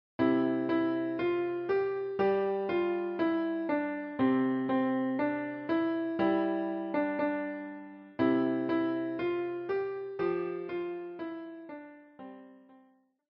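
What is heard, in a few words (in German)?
Freude schöner Götterfunken, Klavier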